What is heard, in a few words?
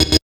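tek chord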